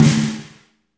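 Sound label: reverb snare left; Spectrasonics; Burning Grooves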